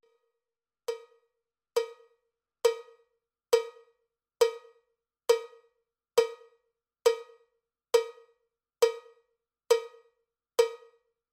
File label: cowbell click track bpm 79; cowbell click track bpm 68